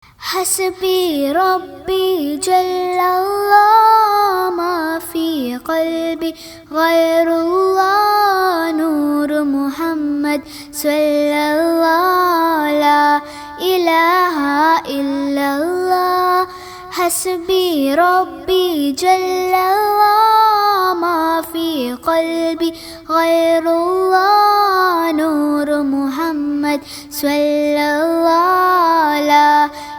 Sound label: Hasbi Rabbi Jallallah Ringtone Naat